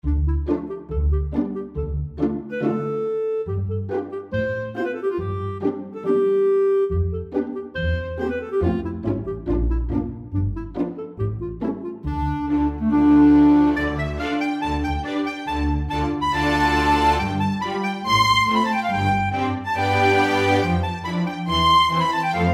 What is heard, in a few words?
orchestra